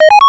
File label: start; PiScan